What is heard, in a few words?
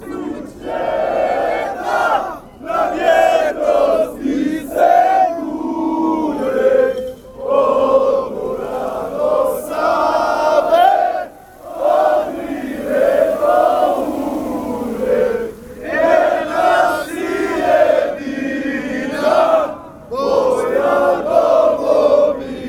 bosnia chant